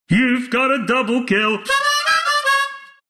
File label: double kill harmonica; dota; announcer dlc